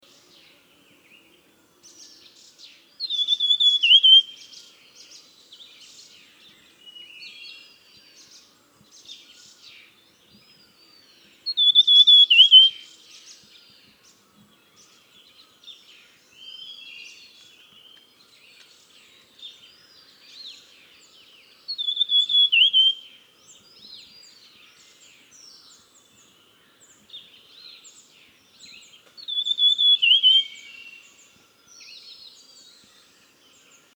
Beautiful Sibia Heterophasia pulchella

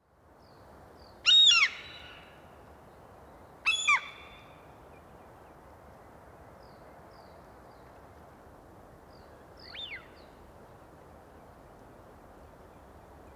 Common Gull Larus canus, common gull call

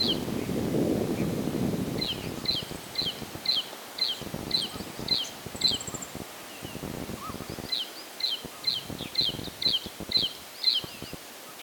Black throated Prinia Prinia atrogularis

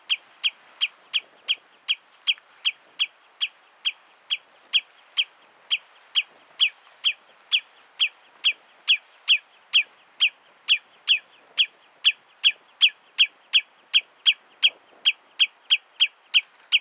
Common Redshank Tringa totanus

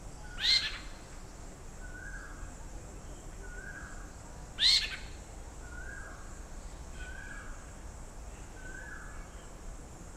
Spotted Owlet Athene brama, spotted owllet call

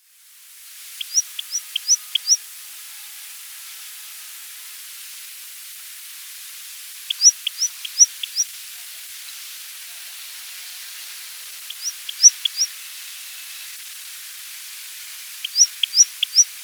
Nilgiri Pipit Anthus nilghiriensis

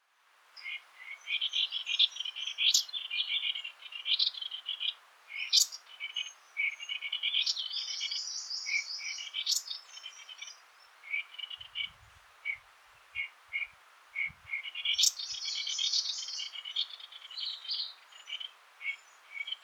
Rusty capped Fulvetta Call

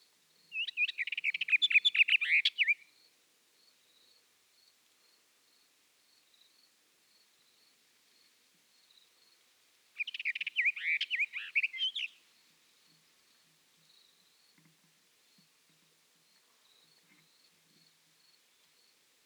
Western Orphean Warbler Sylvia hortensis

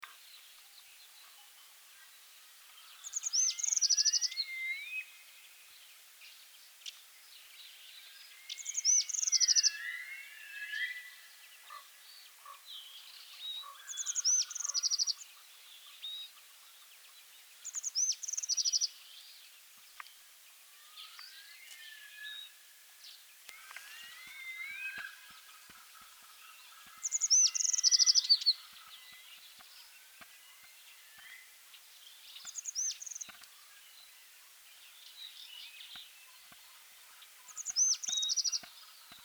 Brown Accentor

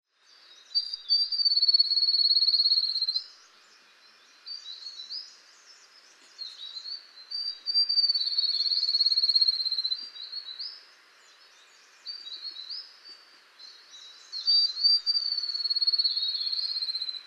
Grey throated Babbler Stachyris nigriceps

